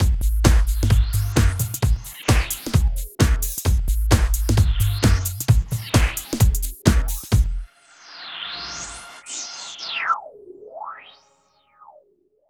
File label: LOOP; East West - Phat & Phunky CD2; 90's Sample CDs